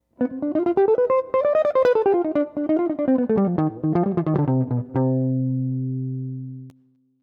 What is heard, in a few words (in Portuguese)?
Trecho menor melodica